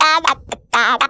Funky Vocals; USB Soundscan vol.18; AKAI

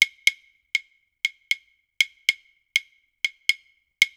PERCS 120BPM; INIS - Opium

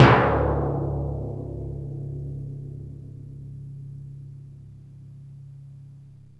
TIMPANI

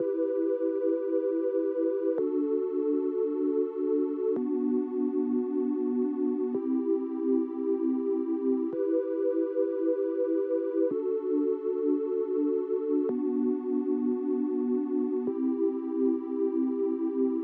PAD, 110BPM Emin; 99Sounds; Monosounds; Music Loops; PANDORA BOX!; Waterfall

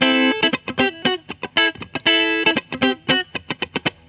GTR; USB Soundscan vol.32; Roots Disco; AKAI

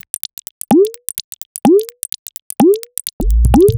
127bpm, House Drumloops

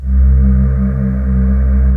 ST. CHOIR C2; USB Soundscan vol.28 - Choir Acoustic & Synth; AKAI

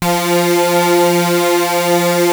Prophet 5 F4; Hollywood Edge - Giorgio Moroder Rare Synthesizer Collection

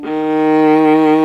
D#2 solo viola; InVision Interactive; Lightware VOL-1; Instruments & Percussions

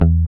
GUI MUTE, E-Gui Muted

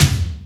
JD800 Dance Card Drums

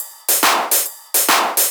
Shuffled Loops, 140 BPM

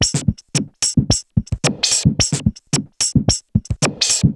Juno Filtered Korg MR-16 (110BPM), beats

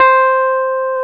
piano Rhodes

